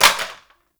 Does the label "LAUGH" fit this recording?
No